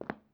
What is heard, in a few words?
step tile soft